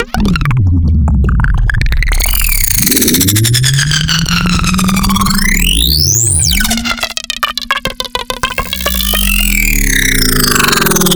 Texture; Sound Design Tools; Textures

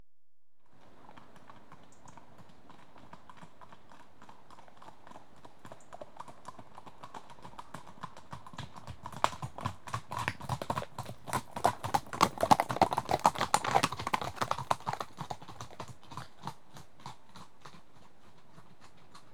Two horses trot past on rough tra; BBC Sound Effects Library

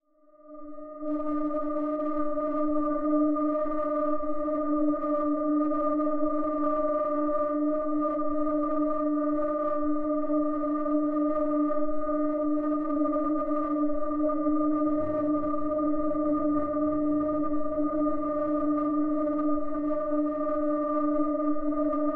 Magnetic Field; 99Sounds; Magnetic Fields

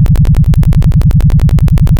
engine; Kenney's Sound Pack; Retro Sounds 2

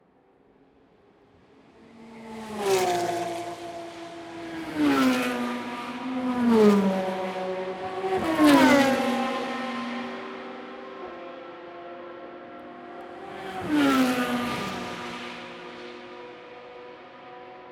hunaudiere pass, Race Cars; Le mans; Chupapsound; Sounds of Le mans

